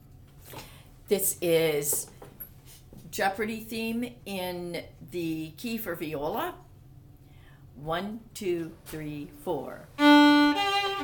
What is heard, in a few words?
viola